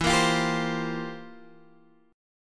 F Major 7th Suspended 2nd Suspended 4th, E, guitar